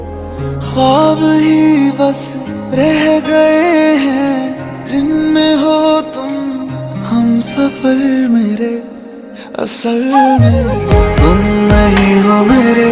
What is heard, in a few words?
New Sad Ringtone, New Hindi Ringtone